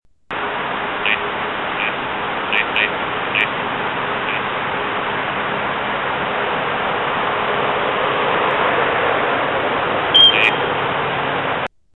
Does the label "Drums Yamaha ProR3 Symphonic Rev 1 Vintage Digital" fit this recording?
No